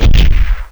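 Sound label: medium explosion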